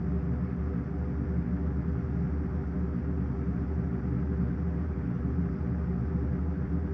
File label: LOOP, CNTRLRM; PaxImp